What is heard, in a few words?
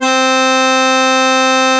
tuba loop note